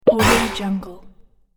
pop robot future hit

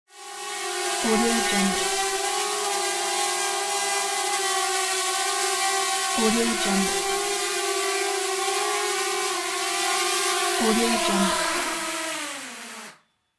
drone flight and landing

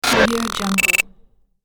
scifi loading bar

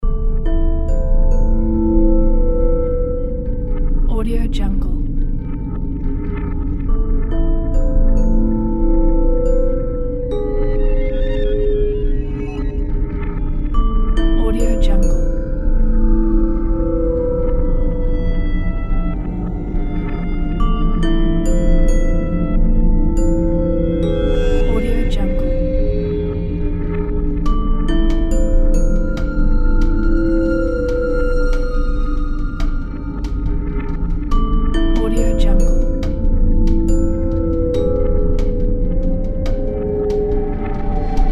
horror suspense

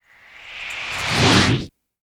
suction deep rumbling scrapes

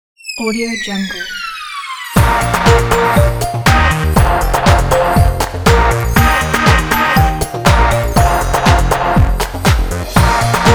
chinese new year

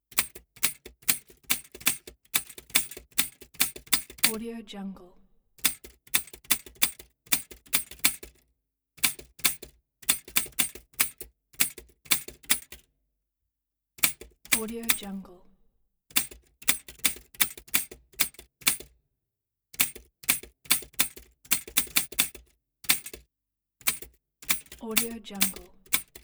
big typewriter typing letters only slow; lokohighman

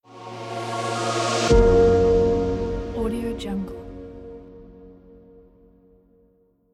logo

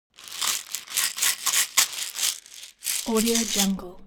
medical pillbox shake; foley; smartsoundfx

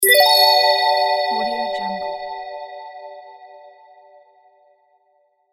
logo opener sound

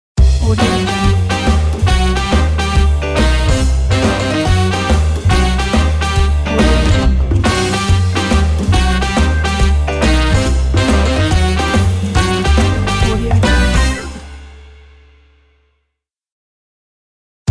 tv logo

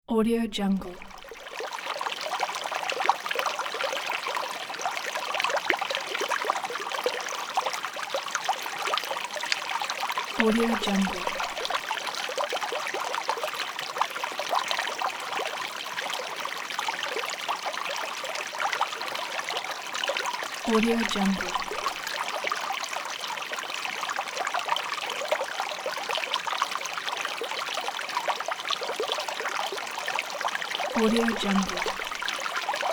pure water flow

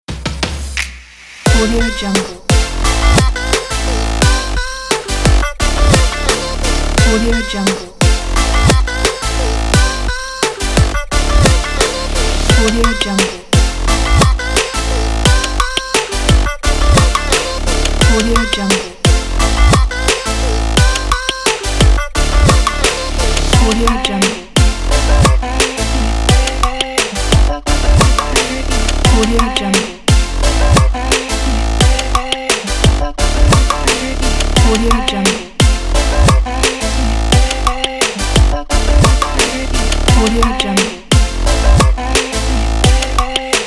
upbeat inspiring future bass

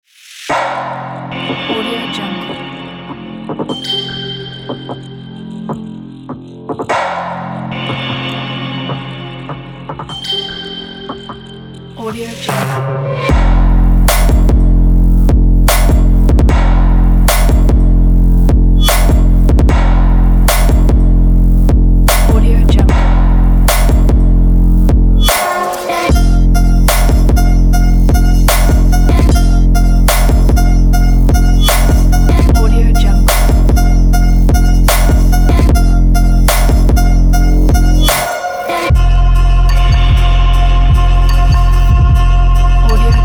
dark sport

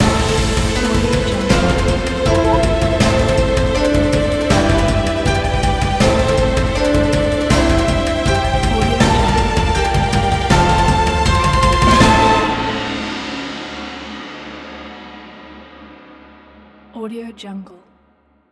china big winning; yellowcatt

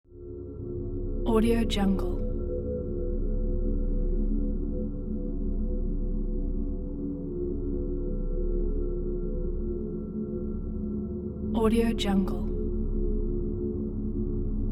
drone

